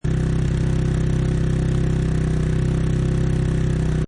Motorcycle Dirt Bike Loop Rpm 2000 Exhaust Perspective